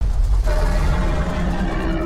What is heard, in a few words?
Filinosoma roar